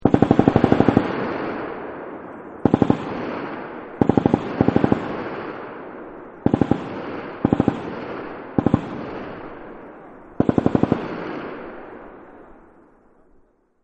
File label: M249 Machine Gun Long Distance Burst; Machine Guns; VRSonic